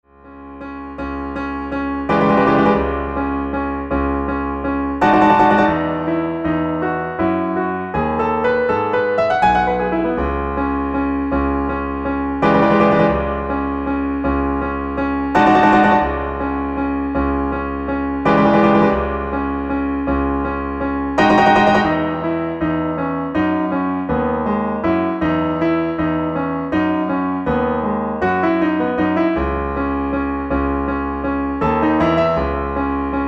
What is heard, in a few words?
Swells